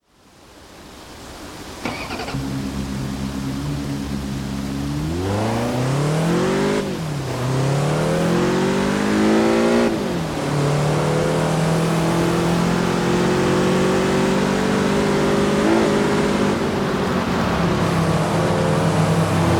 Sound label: saturn sky; TDU-1